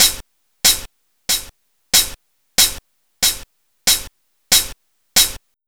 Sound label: HH, Pure Electro; USB Soundscan vol.03 - Pure Electro; AKAI